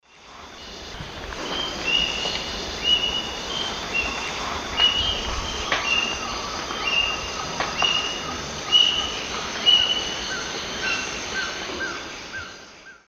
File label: Leucopternis albicollis, calls of pair; S. America; Eastern Lowlands